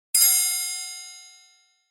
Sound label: chimes